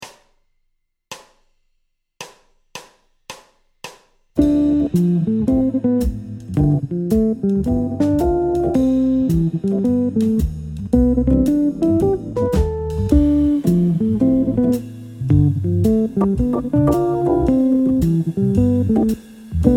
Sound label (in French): Phrase Cadence ii V7 I∆, guitare jazz; motif jazz; arpege honeysuckle